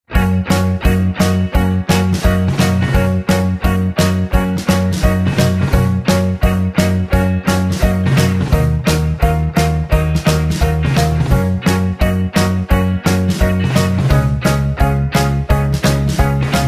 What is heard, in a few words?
Gb To G No Backing Vocals, backing tracks